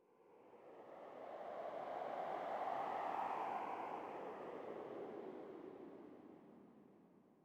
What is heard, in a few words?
sting wind gust; Rust